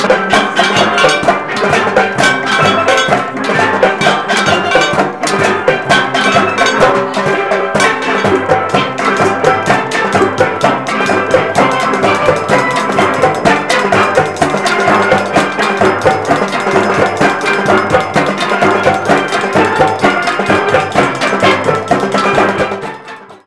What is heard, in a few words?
steeldrum; best service Blue Box